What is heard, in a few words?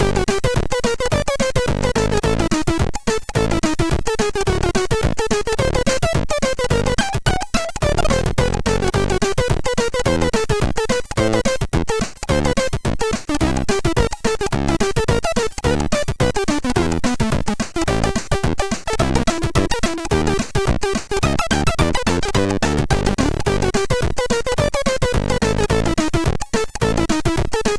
D50 tune, MOS6581